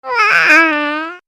yoyo death

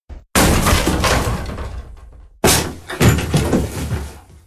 door crashing open

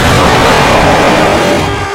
jumpscare sound; nightmare at charle 4

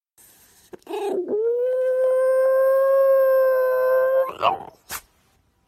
snezz

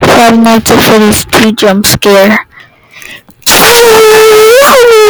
jump fart

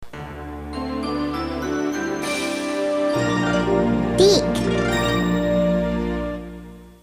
fanfare

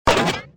mini pekka step

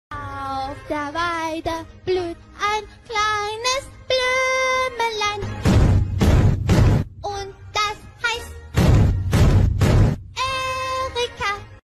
anime girl singing a song

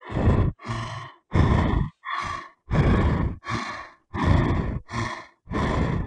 a beast breathing heavily on you

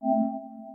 ps5 selection button

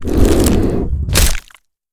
shifting; among us

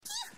sneeze

